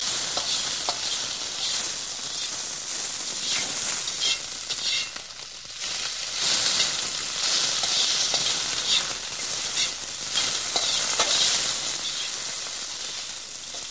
3d Cooking Sound

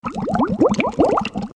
Water Fall

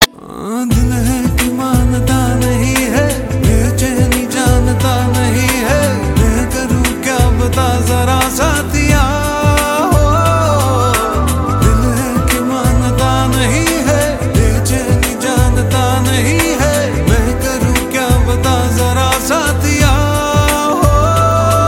Sweet Romantic Hindi

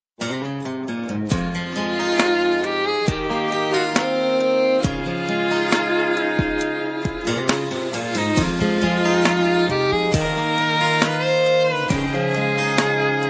Most Sad Ever Tone

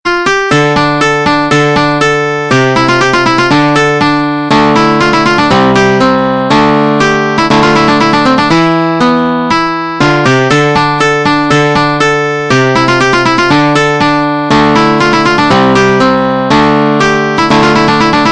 Slow Piano

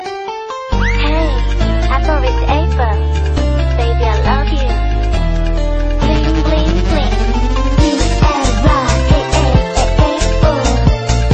Melody

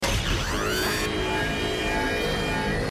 Dialing

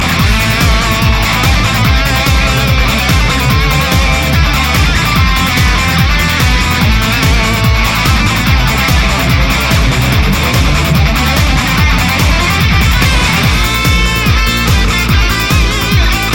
Battle Theme; Dcd

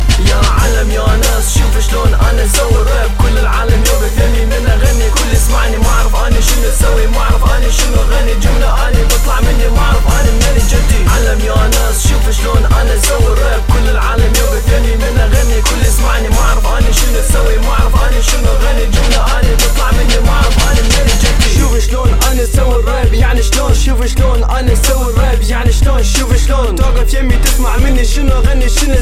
Arabic Rap

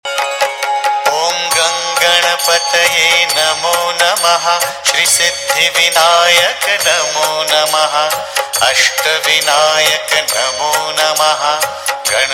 Ganesh Dhun

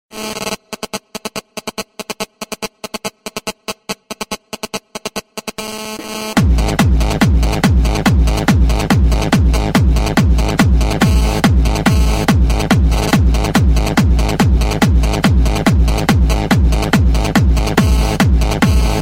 Radio Sms

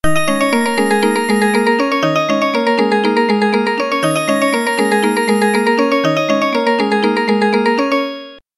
Ring Tone

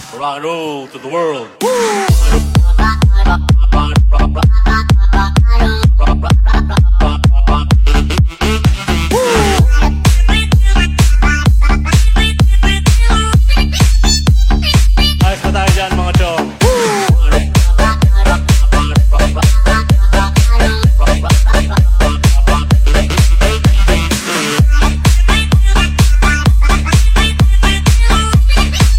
Rock and roll

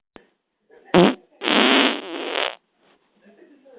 Tornado

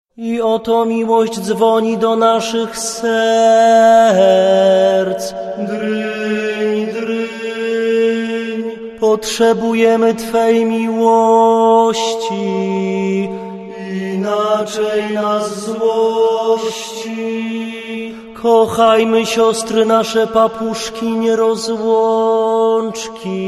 Church; Kosciol

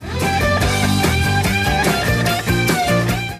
Guitar Solo

